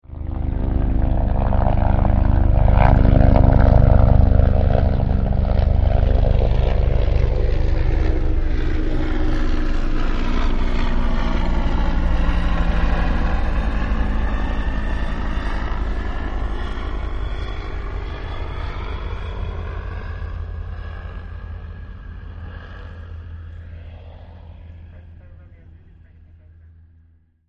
Plane engine far, Plane Engine Rumbling From Far Away; Airplane Sound Effects; Vehicles